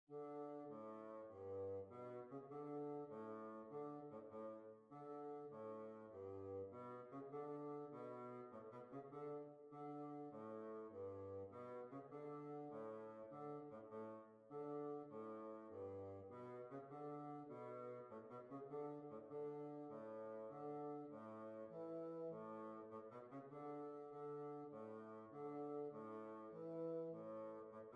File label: Jig; Sally Sloanes Jig